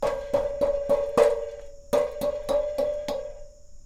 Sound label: perc